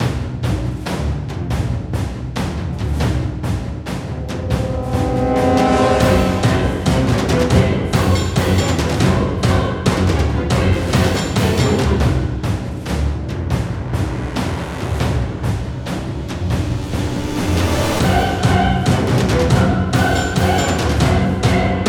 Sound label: Dark Chase Scene orchestral operatic horror